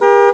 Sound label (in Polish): klakson